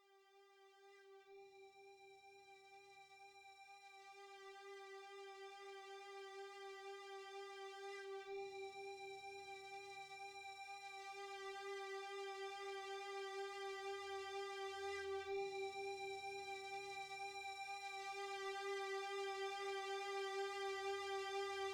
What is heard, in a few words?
Sirens Calling Loop